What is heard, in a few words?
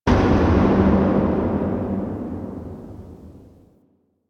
Factory Thud; Warframe; Quests; TheNewWar; Ambience; Zariman